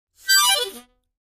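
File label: Mouth Organ Short Fast, harmonica; woodwinds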